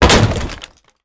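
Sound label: trash can impact, explosions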